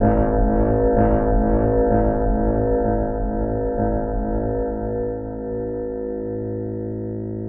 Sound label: Creepy Ambient